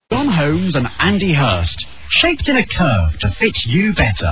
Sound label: jingle